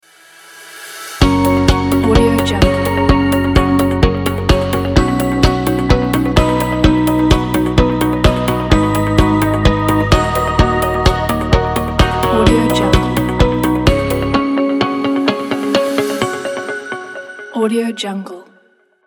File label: corporate ident; gadgets-sounds